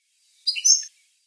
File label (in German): Nachtigallen